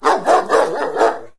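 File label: rural random dog big; random amb sfx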